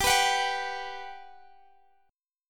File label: G Major 7th, G, down; Listen to GM7 strummed; GM7 Chord